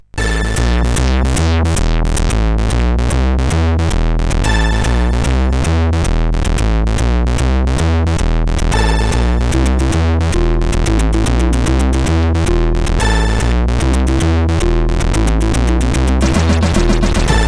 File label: Spectrum tune CSG8580R5